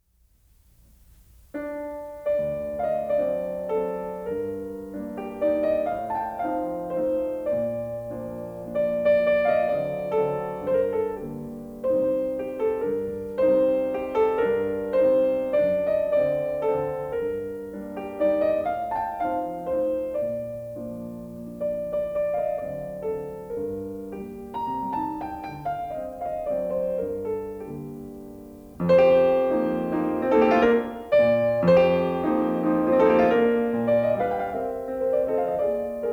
Mazurka; Chopin; Kapell; The collection of mazurkas

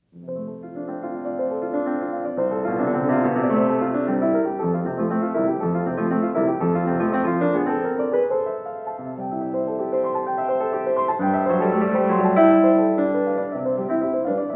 Preludes; Chopin

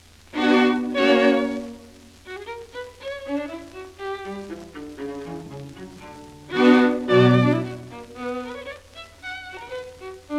String Quartet; Beethoven; String Quartet No14; Lener String Quartet

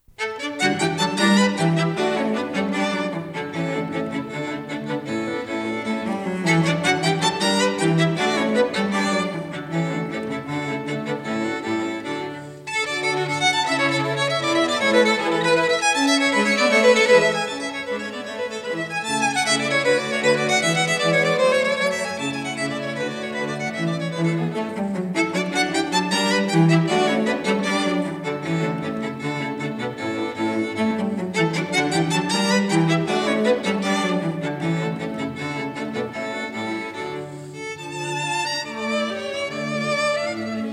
String Quartet; Mozart; String Quartet No2 k155

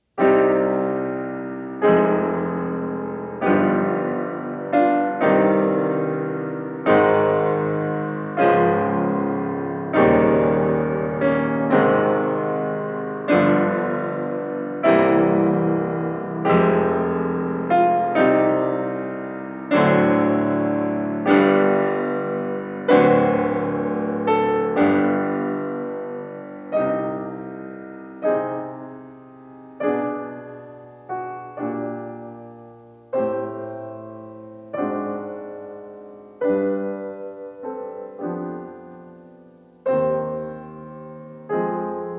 Preludes; Chopin

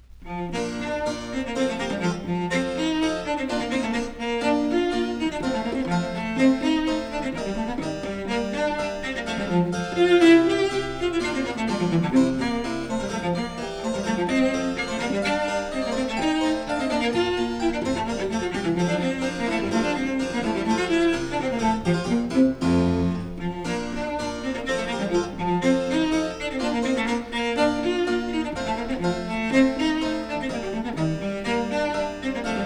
Cello Sonata; Vivaldi; Cello Sonata No6 RV46; Janigro

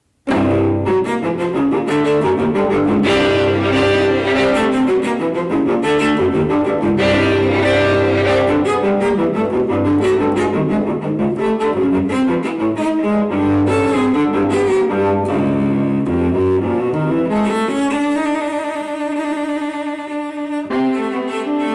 String Quartet; Hindemith; String Quartet No4 Op22; HollyWood String Quartet

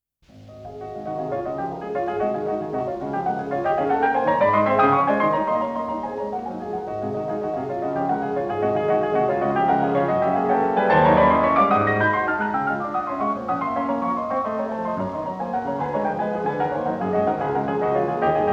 Etude Op25; Chopin

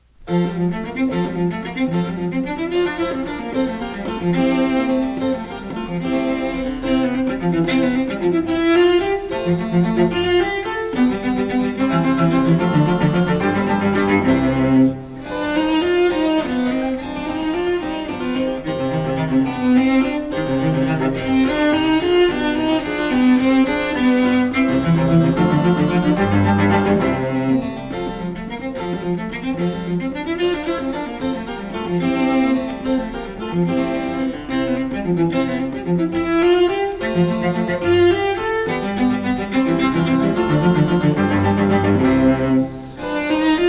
Cello Sonata; Vivaldi; Cello Sonata No2 RV41; Janigro

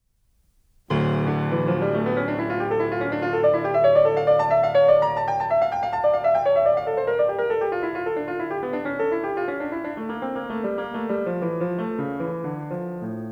Keyboard Suite; Handel; Keyboard Suite No3 HWV428; Heidsieck

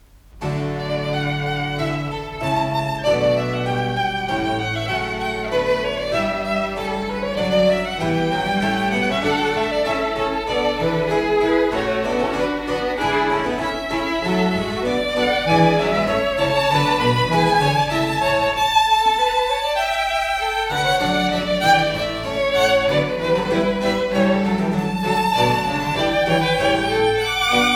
Sonata For Two Violins and Continuo Op5-2; Handel

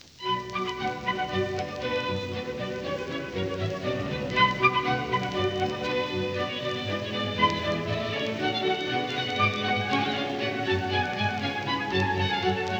Orchestral Suite No2 BWV1067; Bach; Mengelberg

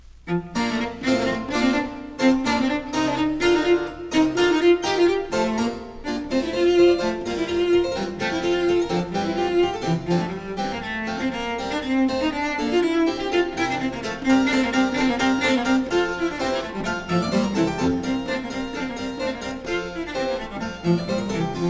Cello Sonata; Vivaldi; Cello Sonata No4 RV45; Janigro

